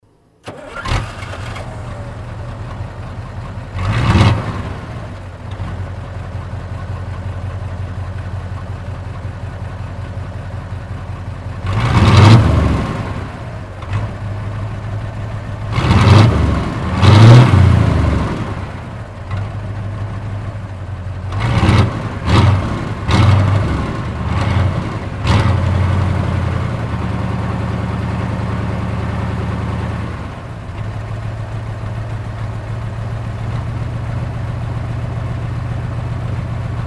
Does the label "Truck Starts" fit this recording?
Yes